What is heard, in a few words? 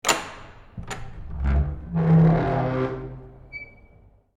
Wood Door Antique